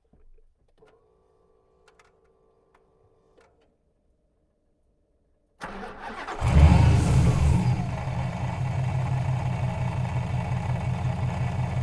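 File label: dodge srt8 2011 onboard start fast drive stop off reverse stop drive stop off engine mix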